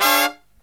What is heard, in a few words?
HI CHORD, Brass